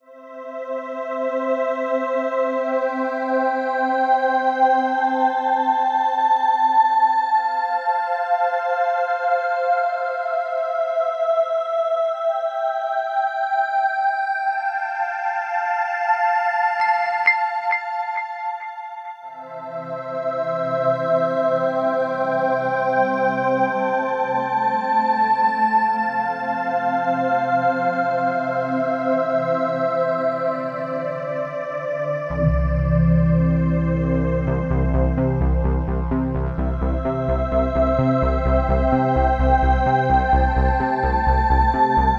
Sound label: crystal choir